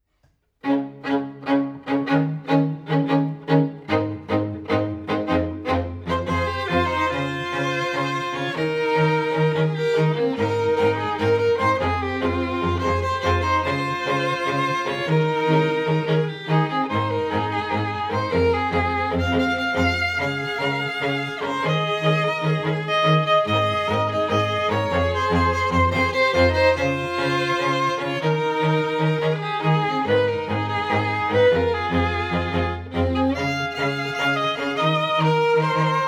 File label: strings